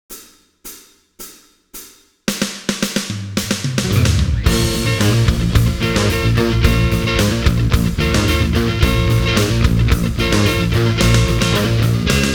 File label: Funky Intro